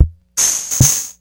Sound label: Kinsman Rhythm Prince